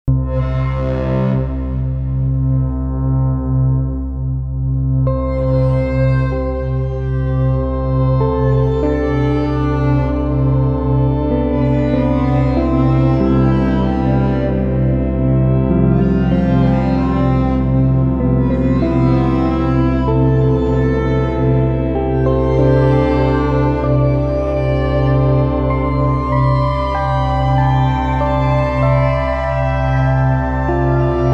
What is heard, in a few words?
Elektron Digitone pad; Boshtypad